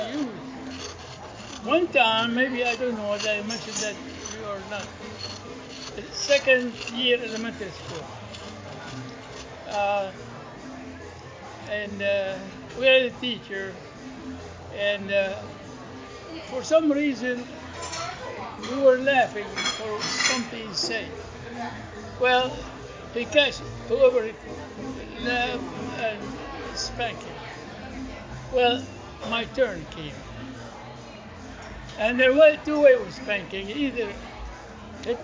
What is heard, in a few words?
fart